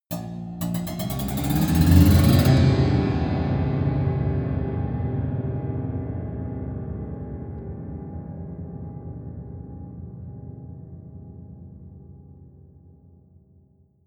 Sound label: piano twang accelerate